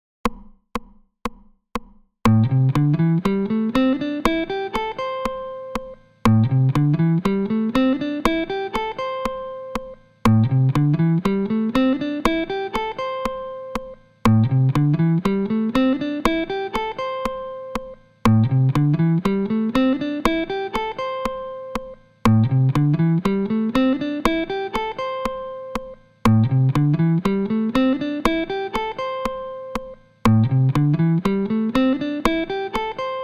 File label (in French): Vers l'aiguë; objectif solo 15 jours pour apprendre a improviser